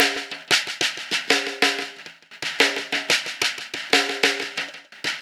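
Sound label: percussion, Roots Funk; USB Soundscan vol.41; AKAI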